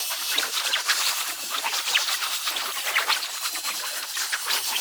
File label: Bow Aim Loop; Ultimate Abilities; Madurai; Warframe; Focus